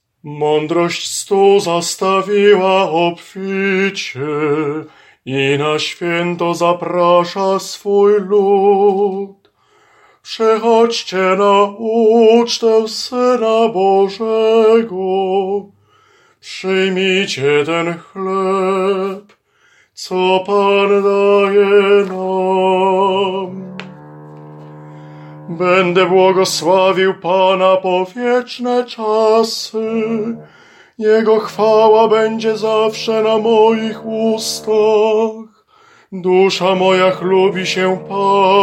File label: SOPRAN; Madrosc stol